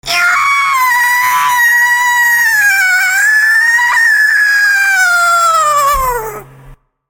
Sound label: Giggle